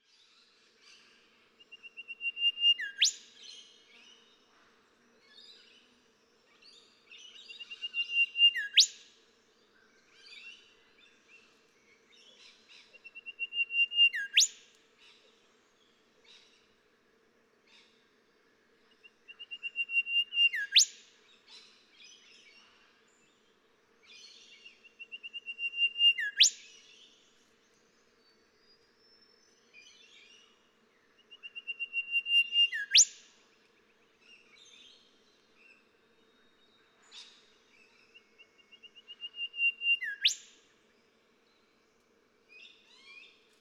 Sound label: Golden Whistler